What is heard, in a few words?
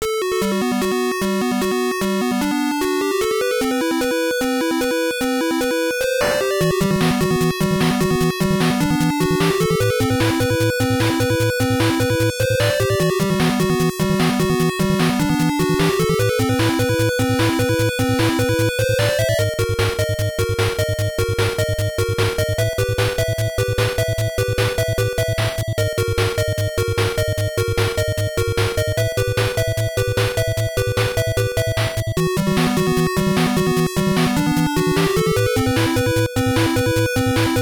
Area Music; Xexyz; NES; Armour